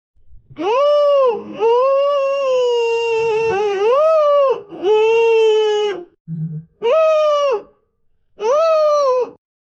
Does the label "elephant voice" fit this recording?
Yes